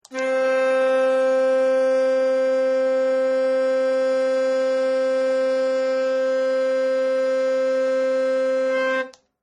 Electric Tooth Brush, Man Using Electric Tooth Brush To Clean His Teeth; Bathroom; Household